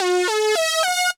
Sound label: Beat